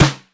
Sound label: TOM SD 2, snare; KICK + SNARE; Best Service; Hyper Dance